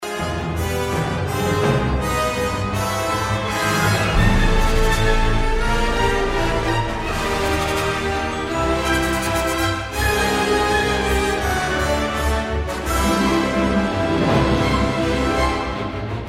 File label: Solo